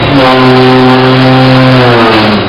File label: Stop Break Snd